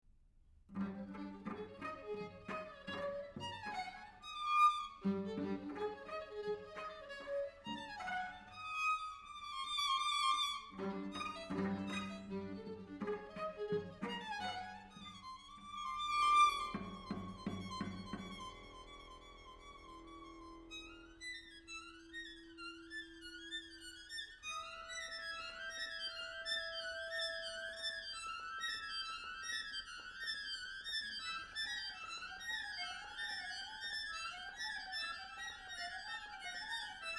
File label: Trio Molto Vivace